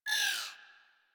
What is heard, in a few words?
Energy Rifle Projectile; Warframe; Weapons